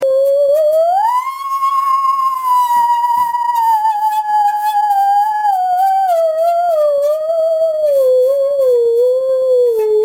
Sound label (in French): scie, sciemusicale